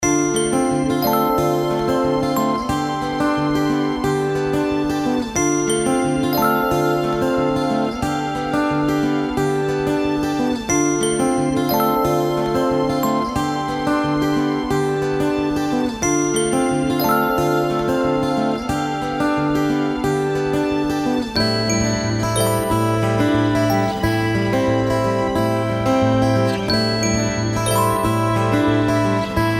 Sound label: Chillout